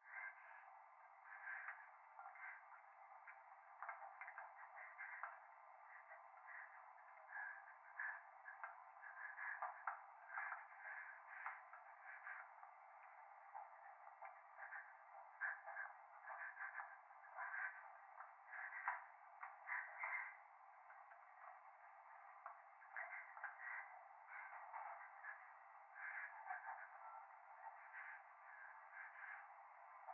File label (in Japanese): 風呂 右耳 快感 吐息 電車